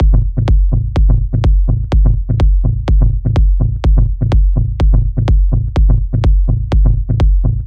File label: Kick and Bass; kick and bass lesson 1